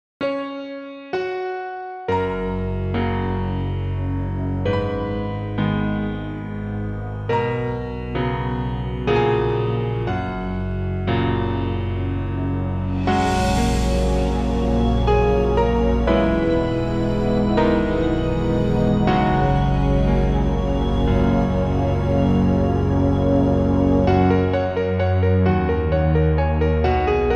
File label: slow